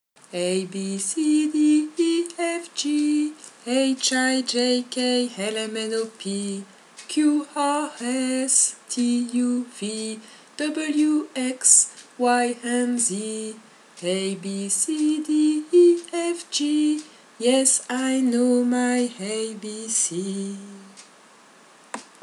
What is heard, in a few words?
abcdefg simple song